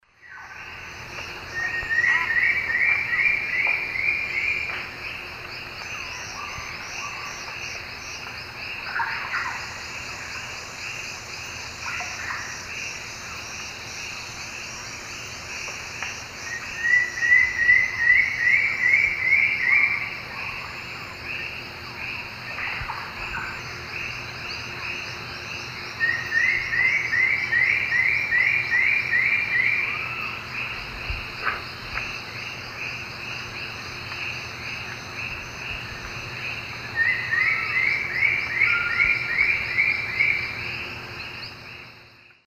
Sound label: Frederickena unduligera, song; S. America; Eastern Lowlands